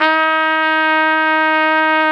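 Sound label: trumpet, harmon dry; Roland L-CD702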